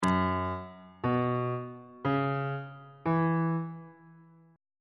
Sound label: g flat dominant 7th suspended 4th chord intervals on bass clef